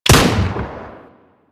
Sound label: Thumper Bot Shotgun Fire A; Warframe; Grineer